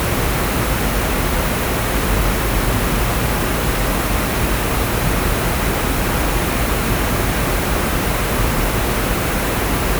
Pinknoise HATS; TS 103 224 Background Noise Database; SymmetricArray

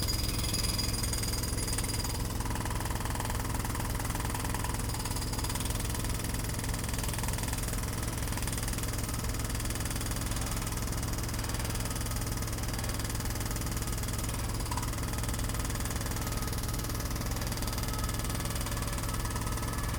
Work Noise Jackhammer binaural

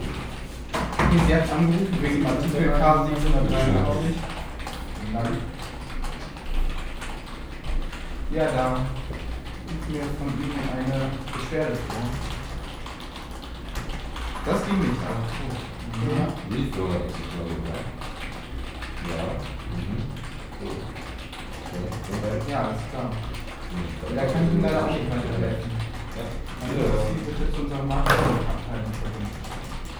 Callcenter binaural, Background Noise; TS 103 224 Background Noise Database

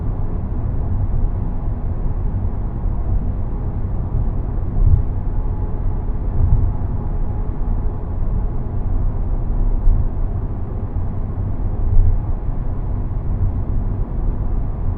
Mid Size Car 80, Binaural, Background Noise; TS 103 224 Background Noise Database

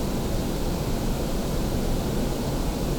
Background Noise, Calibration Set, Desktop; Reference Recordings LF

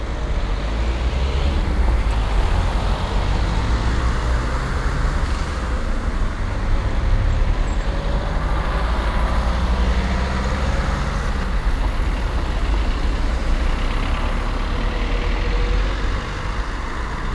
Crossroad noise, Binaural; SymmetricArray

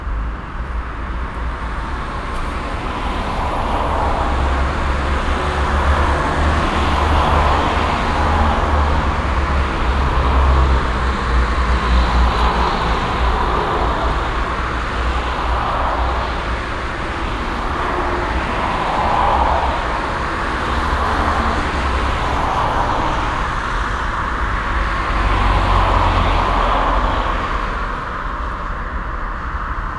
Road noise binaural; TS 103 224 Background Noise Database

